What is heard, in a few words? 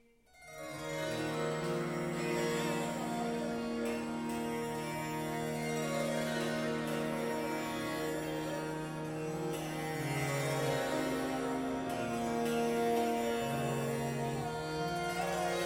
Raga Kafi Kanara